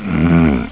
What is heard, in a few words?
small growl, A small growl; werewolf